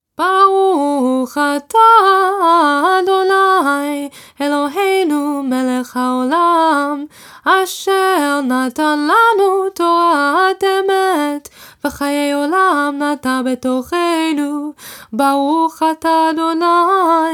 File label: Torah Service Torah blessings AFTER; rosh hashanah